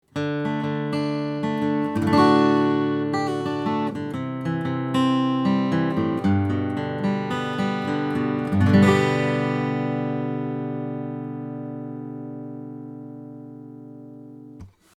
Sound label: ELAM 251 OMNI Acoustic Picking 35cm 15th Fret